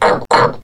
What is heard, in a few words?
grunty, headcrab poison; hl2